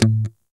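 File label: guitar, funk guitar, Strats, clean electric guitar; PYB Strats; Clean E.Gtr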